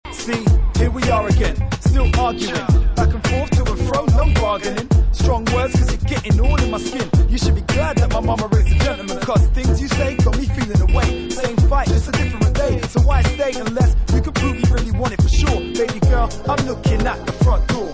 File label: vocal